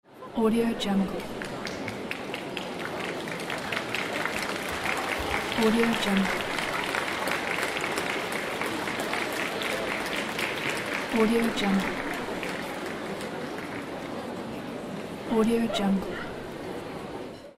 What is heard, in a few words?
applause in the hall